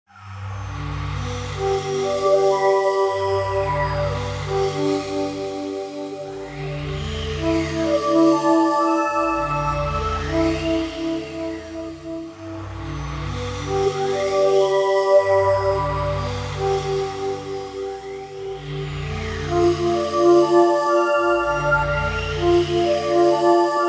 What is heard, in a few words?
Atmo The Fog Customized; Electra